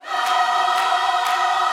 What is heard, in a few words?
120 CIR JOY, Vocal Gospel CIRCLE LPS; Vocal Planet Vol1 Gospel